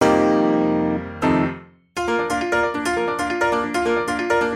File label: PIANO; multitracks